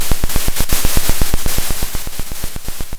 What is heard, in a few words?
Sound of a drum roll